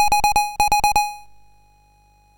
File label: Mini Arcade Climax, MOS6581R3; sid